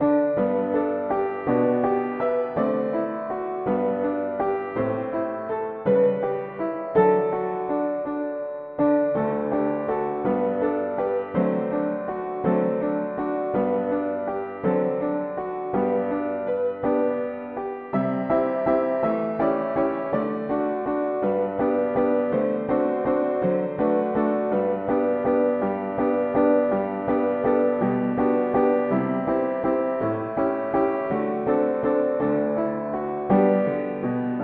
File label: mono piano, hymns